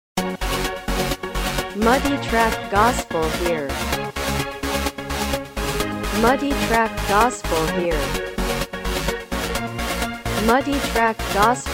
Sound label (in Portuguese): Synth Base